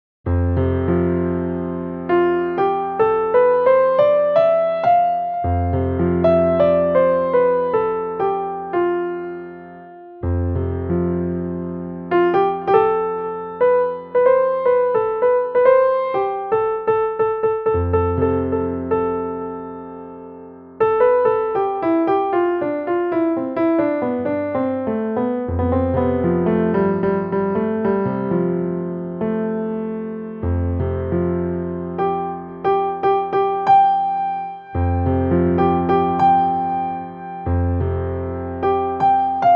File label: F Lydian Improvisation; with Drone